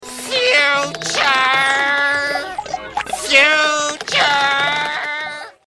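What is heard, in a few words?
spy pain criticial death; squid; huggy wuggy snpc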